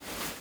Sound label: rug